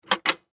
Technology Pay Phone Coin Return Lever Telephone; Telephones; SFX Bible

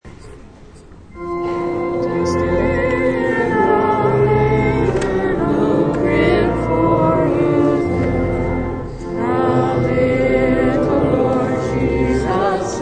Congregation sings Away in the Manger; Away in the Manger Hymn; Churches (Sound Effects:Backgrounds)

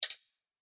Collect A Coin Game Fx; Video Game Sound Effects

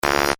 Electrical Zap; Electrical Sound Effects; BlastWave

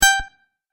Tap Play, button; multimedia

